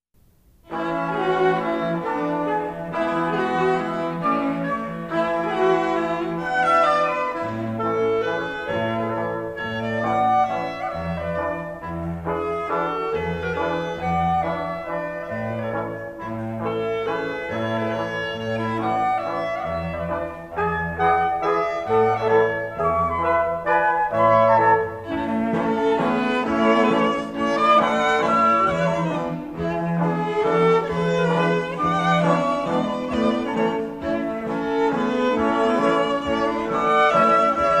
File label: Five Step Waltz